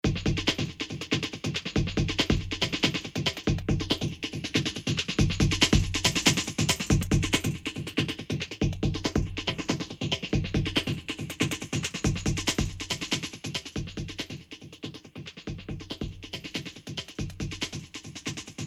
Neuzeit Instruments Quasar Auto Angle Reverb 25 Distance 2.3m